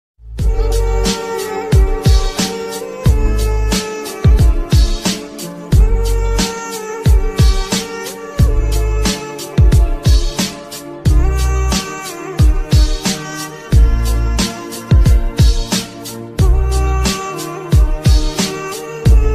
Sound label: heart touching ringtone